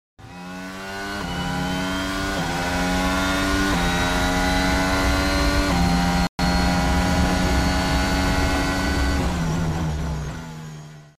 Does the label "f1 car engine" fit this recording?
Yes